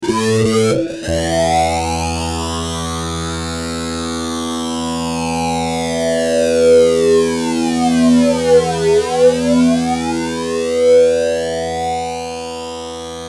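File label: fuzz guitar, MXR flanger doubler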